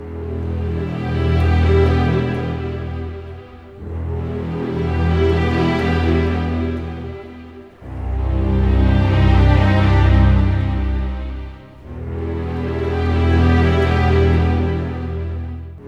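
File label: 60 dark, orchestra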